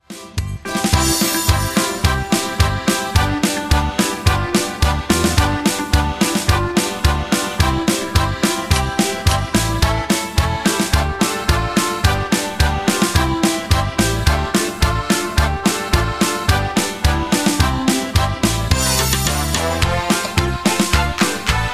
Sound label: Konzert karaoke